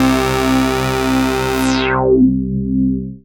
syn c, Dance Synths; ProSamples vol.37; Juno Jam; Best Service

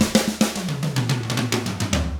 110 SMBA samba; ILIO; Double Platinum Drums 1

